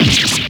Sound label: stab